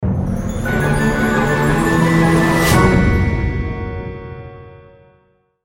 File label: barracks evolve; Might and Mayhem